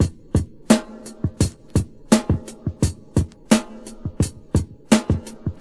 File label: 86 bpm breakbeat f key